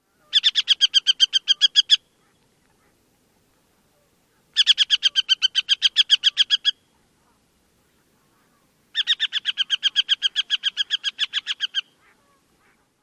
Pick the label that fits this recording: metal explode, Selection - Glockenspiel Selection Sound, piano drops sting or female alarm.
female alarm